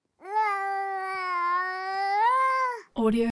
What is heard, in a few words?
baby laughing; prosoundfx